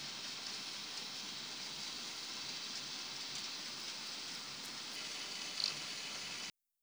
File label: social